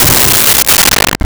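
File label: Orchestra Hit C4; Nintendo; July 2020 Gigaleak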